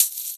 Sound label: Shaker Long; Drums